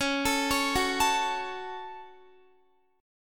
Db Suspended 4th Sharp 5th Guitar 4E,4A,5D,5G,5C,6F; arpeggiated; x,16,19,18,18,16